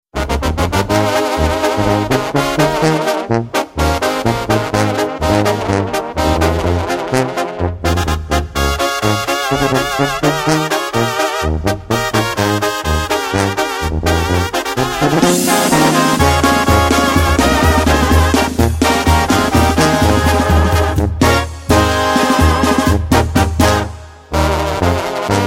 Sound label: Banda; Chicago